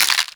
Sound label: Infested Sniper Rifle Mech; Weapons